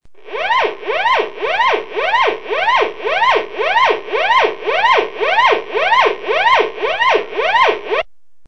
Barco sirena

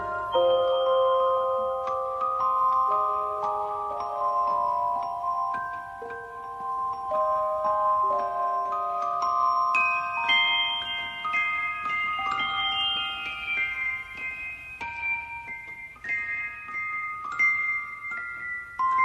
piano triste

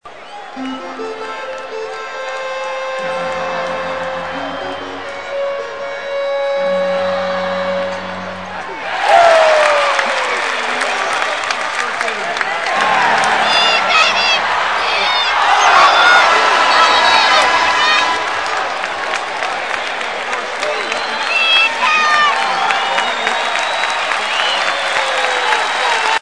Baloncesto ambiente

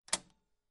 cajas registradoras

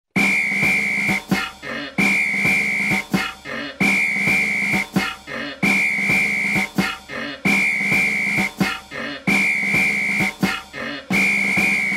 burro marchando

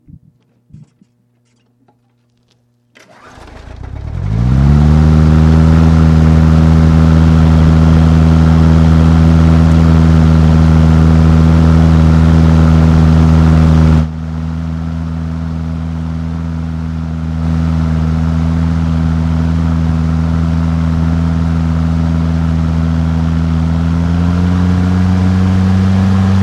motor

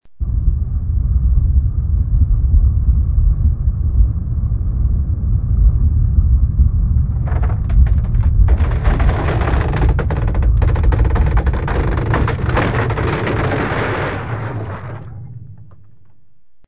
Terremoto

